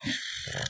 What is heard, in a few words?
snoring; toadette; sm64coopdx; char select extra chars